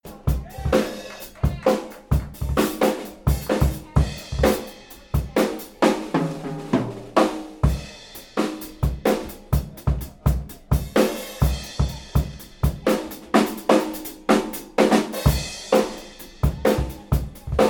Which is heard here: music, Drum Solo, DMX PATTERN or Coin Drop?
Drum Solo